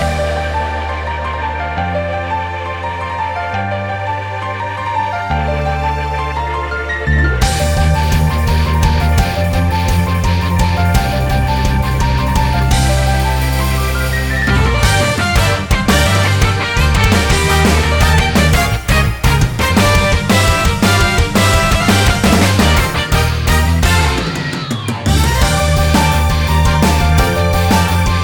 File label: Raid loop; PeriTune Material